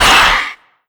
pain, antlion; creatures; vox

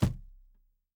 phys cube sm; Portal 2

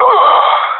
death; Counter Strike; CS:GO; professional epic

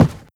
step run, footsteps cardboard

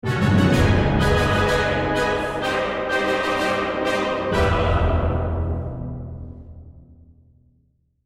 lost round, music

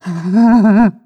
breath long, zombie

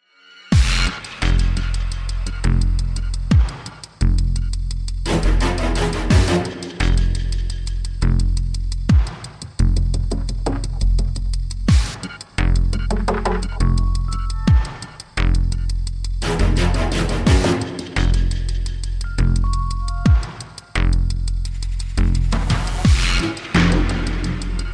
bomb planted

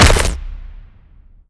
bolt huge blast

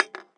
metal popcan impact hard; physics

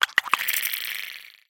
curious, clams; infestation

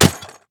weapon sniper impact; physics

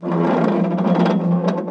metal stress

